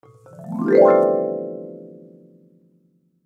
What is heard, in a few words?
Marimba Run; Mallet; Blastwave